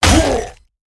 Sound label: Brute Attack